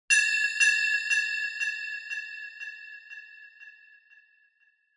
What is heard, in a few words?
Accent